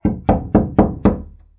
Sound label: knock wood door; zps